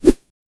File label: short smooth whoosh; movement